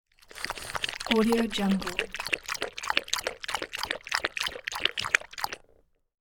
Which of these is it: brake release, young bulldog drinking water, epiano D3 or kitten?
young bulldog drinking water